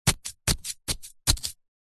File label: horror knife stab into body several times fast